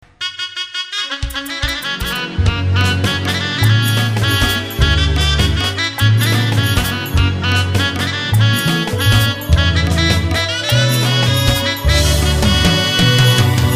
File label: mere mowla instrumental of sarangi shehnai bansuri